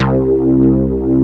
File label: CS-70 PAD; East West - Global Sounds